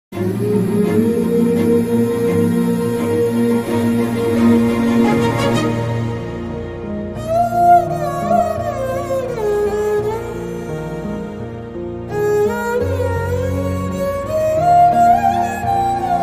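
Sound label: background music ringtone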